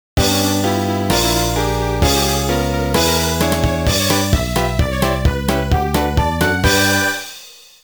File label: Final Boss Clear; Drawn to Life SpongeBob SquarePants Edition; Nintendo DS